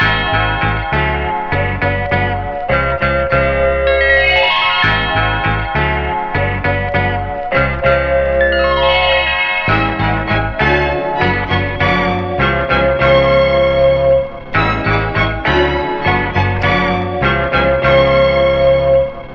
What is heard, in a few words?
guitar keys and strings right now; Loops; Phonky